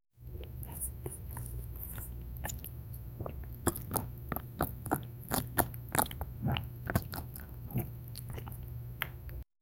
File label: asmr, lapping